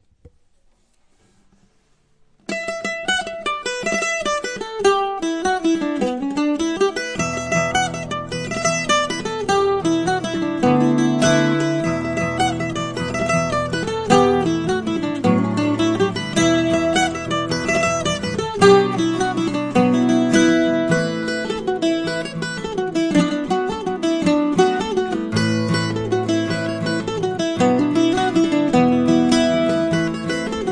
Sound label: mandola guitar